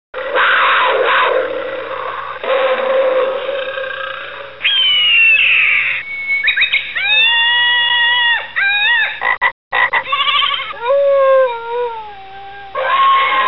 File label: Animal Sounds Babble Balls